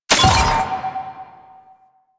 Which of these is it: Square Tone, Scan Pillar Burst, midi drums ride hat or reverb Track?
Scan Pillar Burst